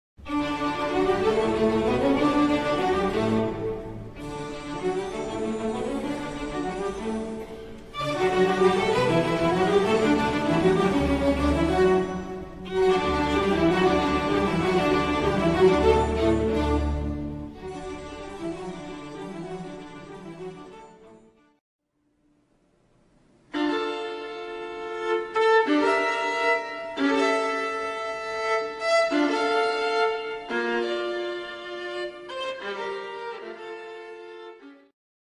violin viola violonchelo y contrabajo

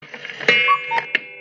Reloj Cucu

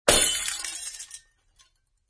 golpe cristal

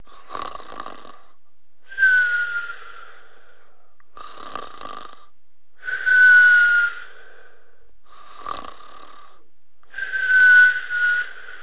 ronquido con silbido

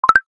Snapchat Notificación

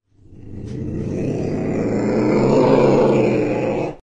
ocelote

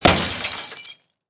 botella de vidrio rompiendose

